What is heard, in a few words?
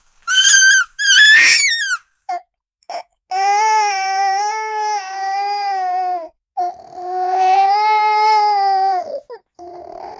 month old toddler boy, gurgling